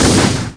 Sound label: EXPLOS; lomax